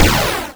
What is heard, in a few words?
Laser; ninja-runner; arcade-canvas